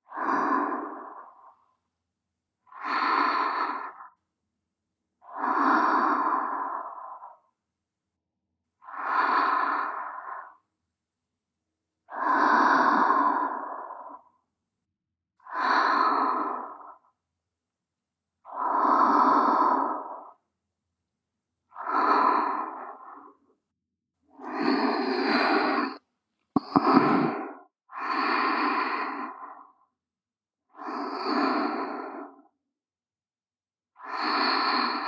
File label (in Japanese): 友達みたいな彼女の寝息 (左) (ループ); SEあり